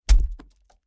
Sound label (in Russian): votknuli v trup noj